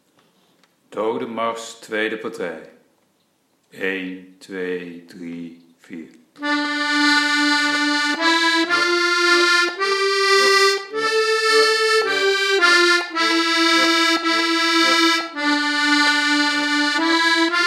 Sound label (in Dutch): doode marsch tweede partij